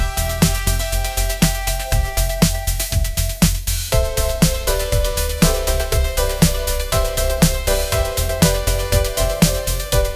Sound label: bright pop energy; ai